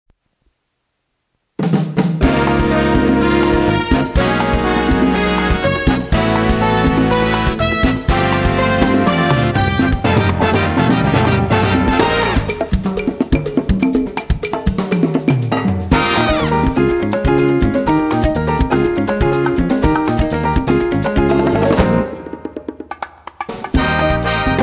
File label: Jingle Bells